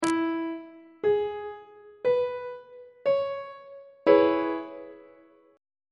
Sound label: d double sharp major 6th chord; treble clef